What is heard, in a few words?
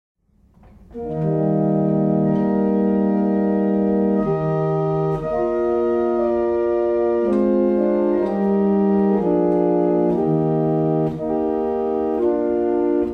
barrel organ; Lillington